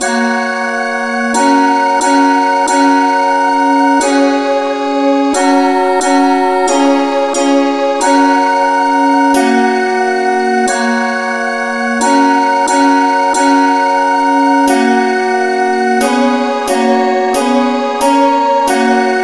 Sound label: no vocal key fis